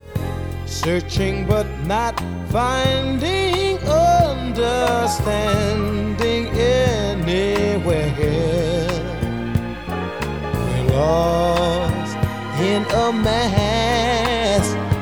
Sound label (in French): couplet pont